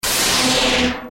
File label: rolling ball